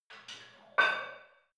barbell light plate put on hit reverby soft

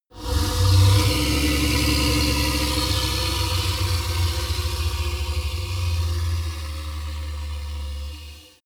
golem growl domination long; schreie

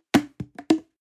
plastic can small fall bounce hard; objekte; dose

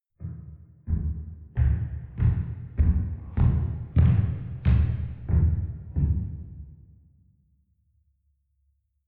step church wooden stairs medium-fast

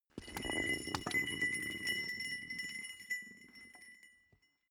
small metal rolling; industrie wirtschaft; metall

